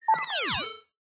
science fiction computer tone fm activated or similar; zapsplat